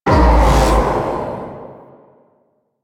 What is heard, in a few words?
Spear Gun Throw Proj Buff Hit; Weapons; Warframe; Tenno; Hoplite